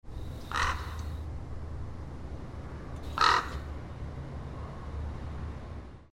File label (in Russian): vorona nochju v gorode